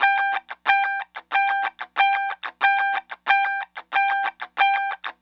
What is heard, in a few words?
Call And Response Guitar, 70s Analog Funk; Sonic Foundry (Sony Creative Software); What it Is!